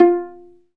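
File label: viola pizzicato, Solo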